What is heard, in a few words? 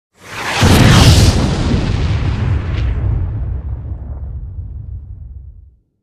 large explosion